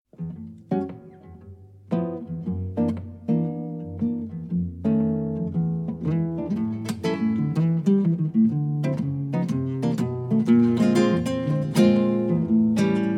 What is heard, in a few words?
Blues